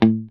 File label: STRAT, Classic Guitars; E-MU Formula 4000 Series Vol. 3 – Analog Odyssey